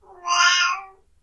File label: old cat